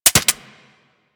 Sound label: Lever Action Rifle Mech, Weapons; Warframe; Tenno